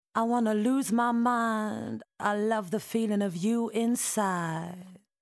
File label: Presonus SL3 Vocals dry